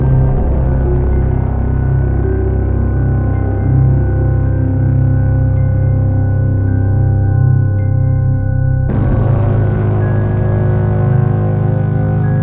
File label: Fear Loop; Face It A Game to Fight Inner Demons